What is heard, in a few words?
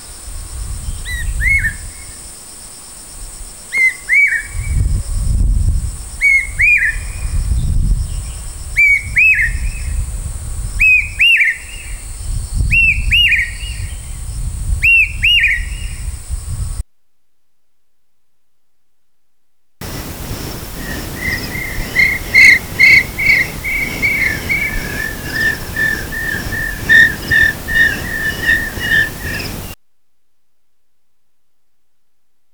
Lhcuckoo, birdcall